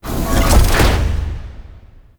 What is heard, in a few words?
Interior Orb Station Door Elevator Close; Warframe; Tau; Orbital Station